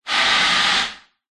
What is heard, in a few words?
industrial machine air burst hiss release large slight reverb; zapsplat